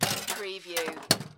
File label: Pinball sound, launch, slide and clank